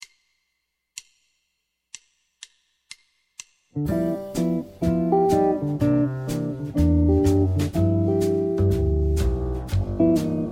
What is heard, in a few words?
G jazz blues guitar chords